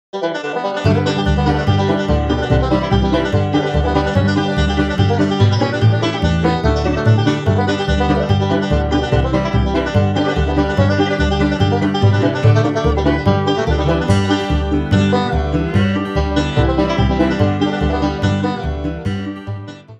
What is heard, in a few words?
banjo, bluegrass